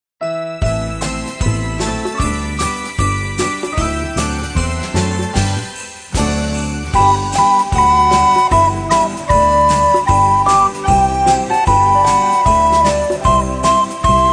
jingle bells

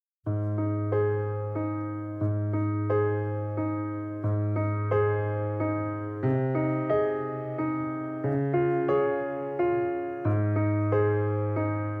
slow waltz

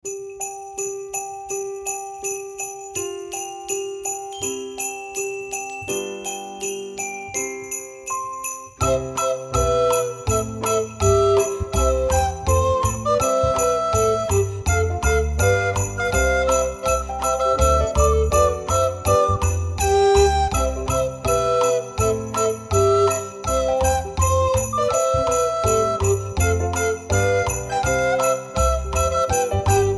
jingle bells

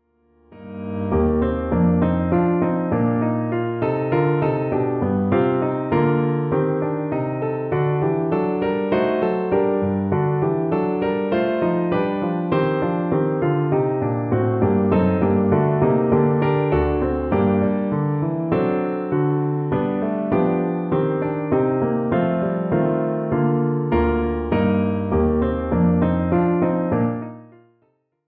gavotte; f couperin

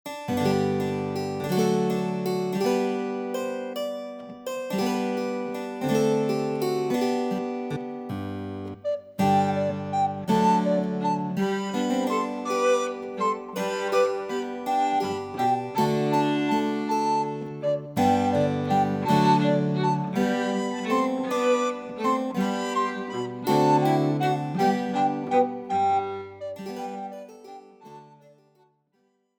Gigue

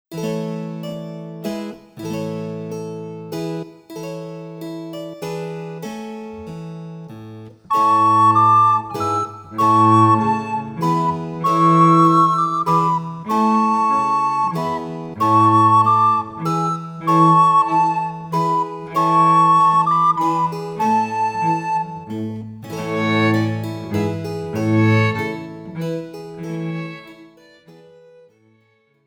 Musette

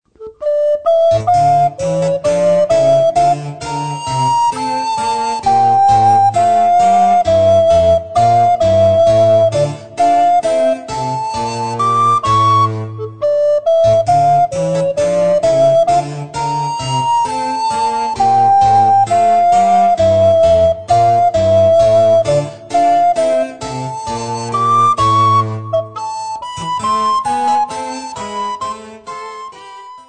Gavotte

